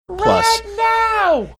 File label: singing right now